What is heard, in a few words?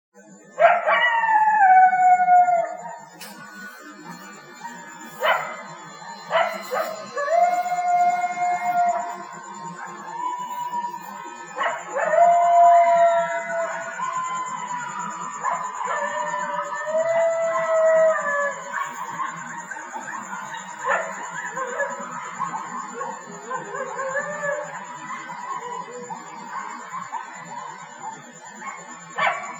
Laurel Canyon Coyotes